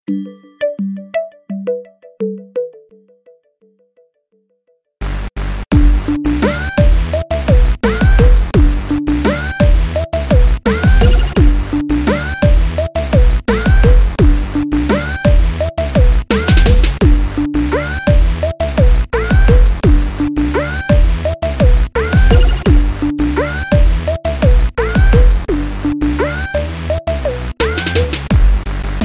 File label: Hip Hop Remix